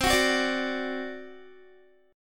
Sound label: C Suspended 2nd Flat 5th C down Guitar; Csus2b5; strummed